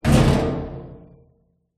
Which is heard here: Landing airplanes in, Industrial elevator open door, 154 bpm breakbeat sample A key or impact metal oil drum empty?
impact metal oil drum empty